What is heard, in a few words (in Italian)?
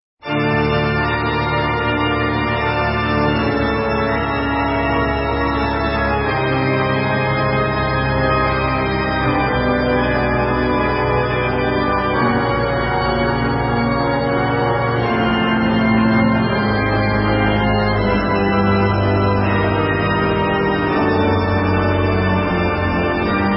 Un Organo Suona; Giornata Nera Per Lariete